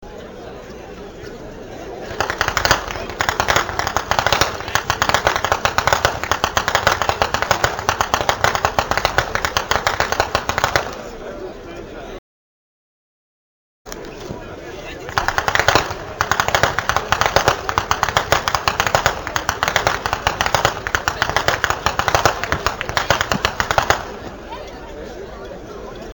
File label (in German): schnalzen; steindorf